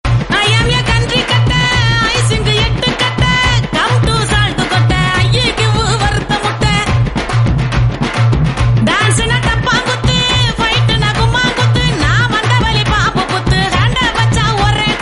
gaana tamil

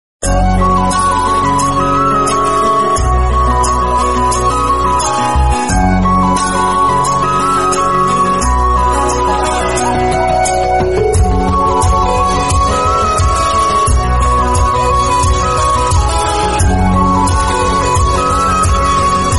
tamil love song bgm

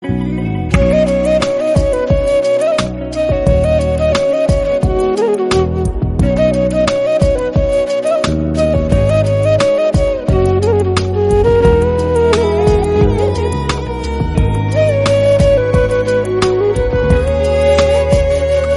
hindi best flute

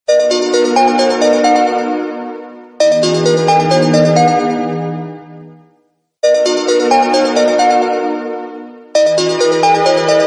sony xperia most new, ringtone

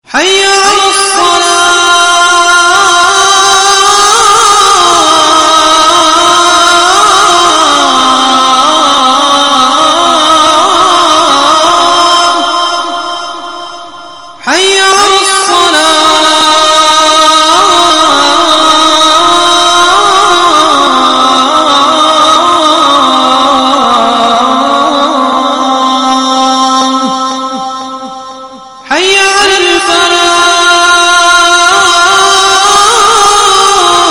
azan

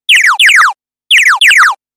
alien phone

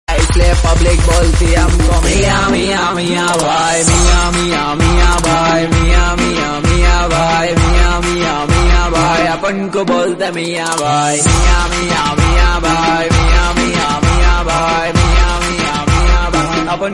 hyderabadi rap

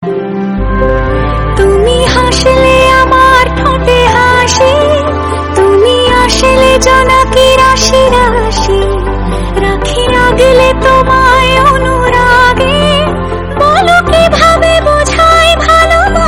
bengali song

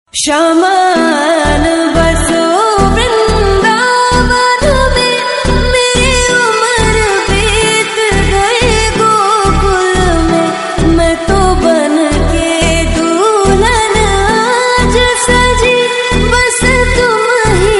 lofi slowed and reverb

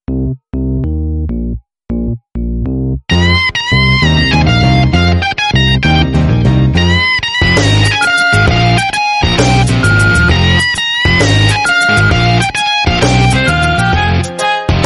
beep song

